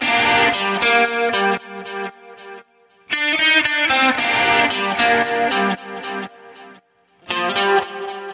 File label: G Gtr Humbuck; Zero-G; Future Wave